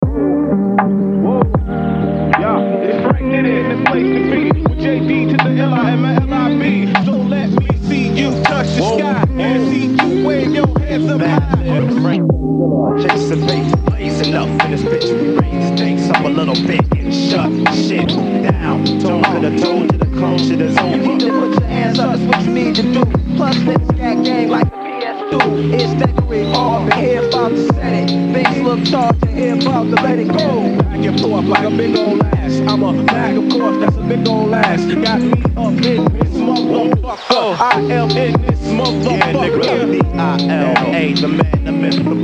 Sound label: guitar